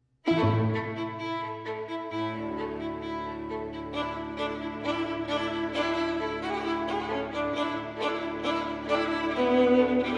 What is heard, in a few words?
String Quartet, Allegro giocoso; Kodaly; no 2 op 10 III